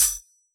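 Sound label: Hi-Hat Open, Yamaha DJX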